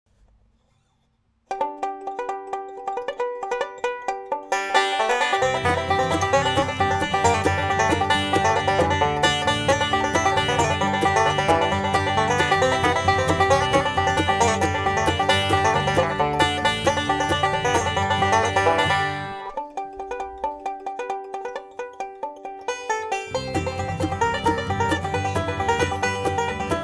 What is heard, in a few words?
bugle call